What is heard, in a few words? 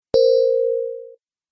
Tap Error, tapping